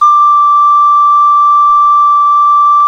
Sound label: Clay Flute